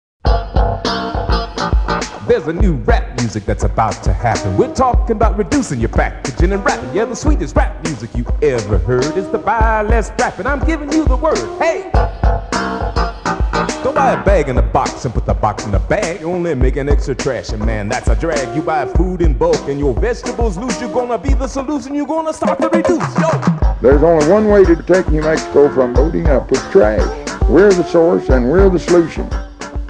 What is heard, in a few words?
Rap Soul; RecycJings